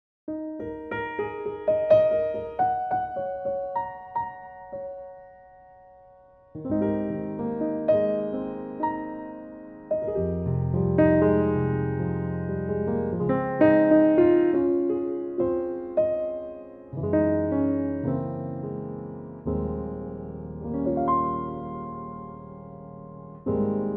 Ab piano

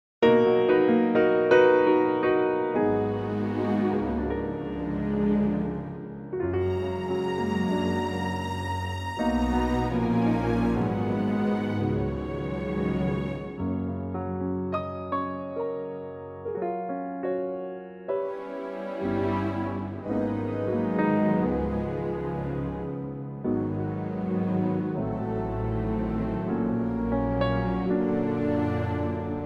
Gb orchestra

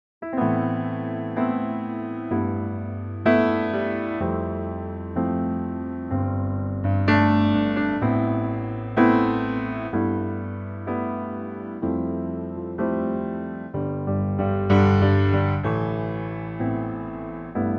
Am Piano

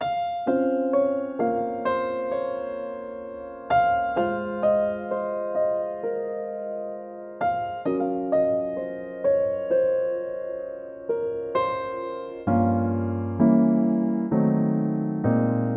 Db piano; Pianotrak

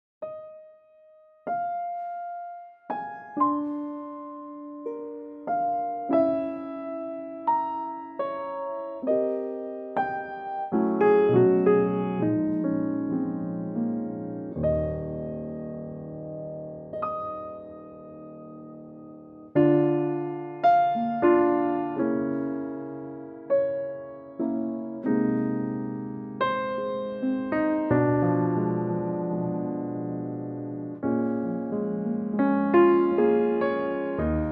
Ab piano; key - Ab - range - Bb to Db; Pianotrak

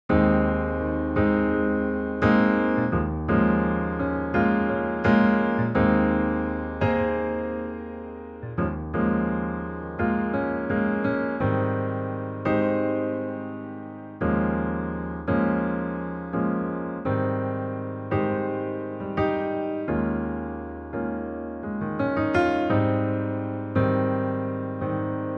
Ab piano

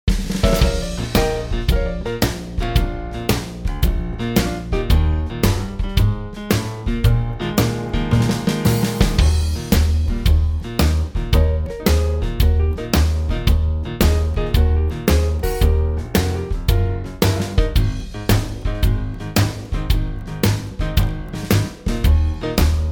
Eb trio; key - Eb - vocal range - Eb to G; quartet arrangement of this old standard

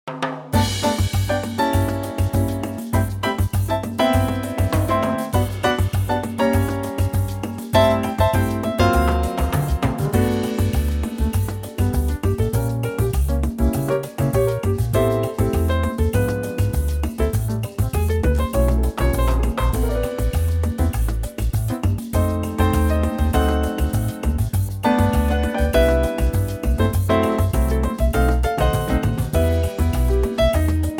G latin

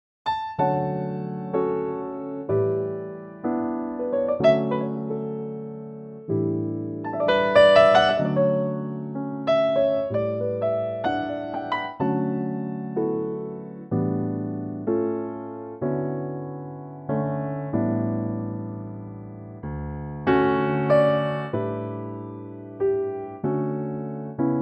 D piano

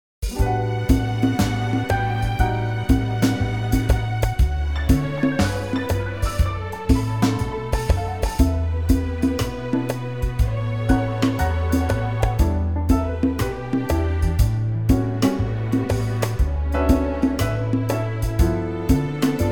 D orchestral

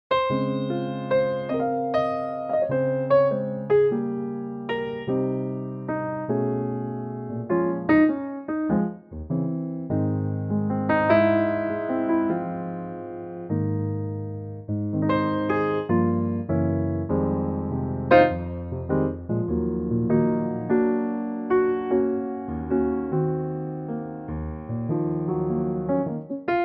Ab Piano; key - Ab - vocal range - Eb to Ab

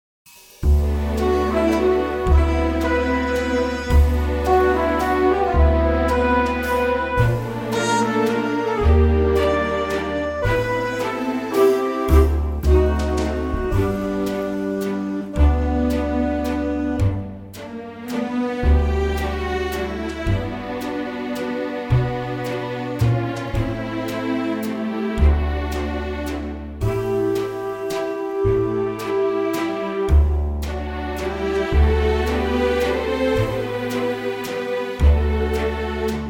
G orch

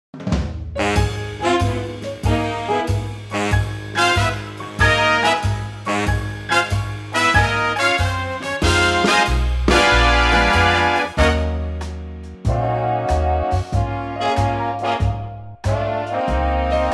Db swing; key - Db - vocal range - Ab to Eb

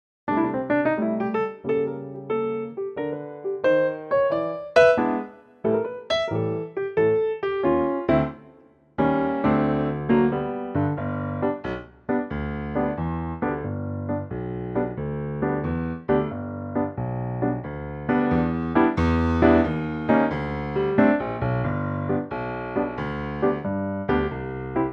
G piano; key - G - vocal range - G to A; Lightly swinging piano arrangement of this classic standard

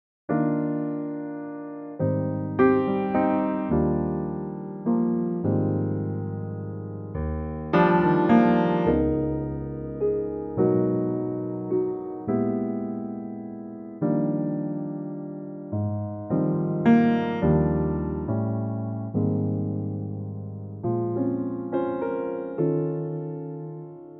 piano Eb

